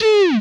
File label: Sci Fi Gun, Blast; Space Blasters2D